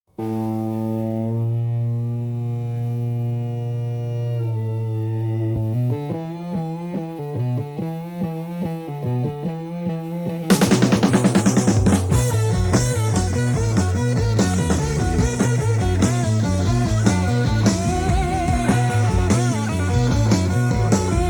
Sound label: Instrumental